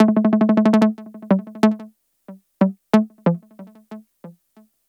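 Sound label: synth, Hip-Hop & R&B; USB Soundscan vol.47; AKAI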